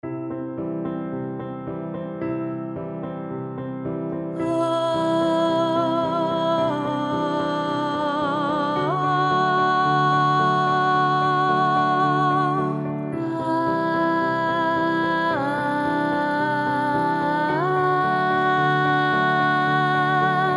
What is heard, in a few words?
female voice; exercise 4